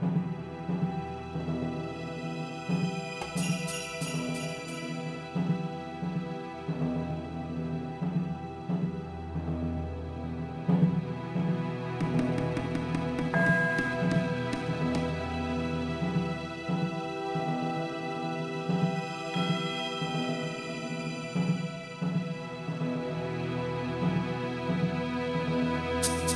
sneak level; Sid Meiers Pirates; XBOX

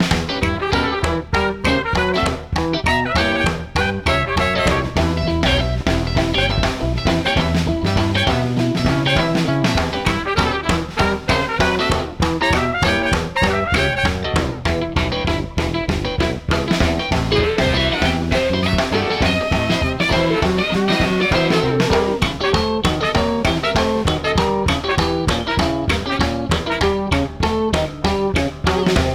credits clock puzzle tune; Voodoo Vince; XBOX

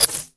rollover; Quantum Redshift

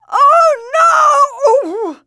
knock; True Crime Streets Of LA; XBOX

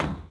footstep run steel hollow

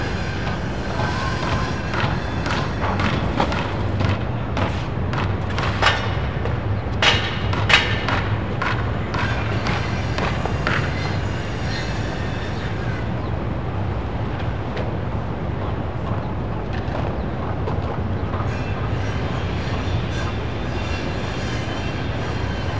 Builders, Ambience; Project Gotham Racing 2

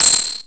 took item; Pirates Of The Caribbean

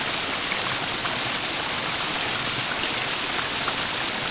wtrfall SML; The Elder Scrolls III Morrowind GOTY; XBOX

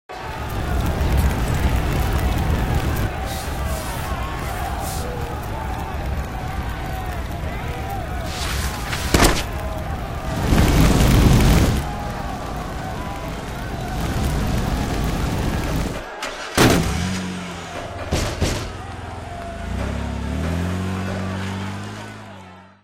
riot; Marc Ecko's Getting Up: Contents Under Pressure; XBOX

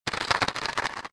scuttle, mine

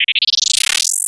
holomap activate; Star Wars Clone Wars; XBOX; Xbox Live Effects

